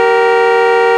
Clackson, motori

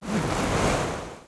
onde del mare, natura

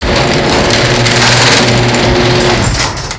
seracinesca

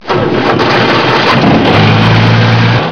tank on, motori

